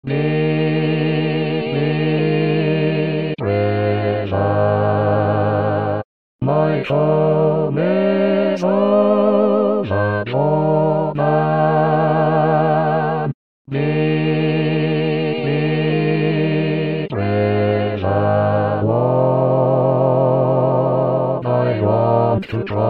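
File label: bass; Deep river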